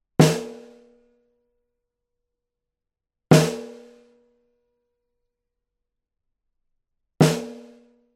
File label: Snare - C12 Omni - Edge modelled; edge duo srovnavaci test